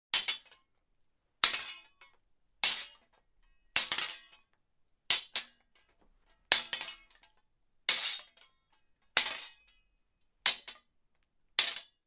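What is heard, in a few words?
blows of light swords or sabres; Звук мечей; Звуки сражений на мечах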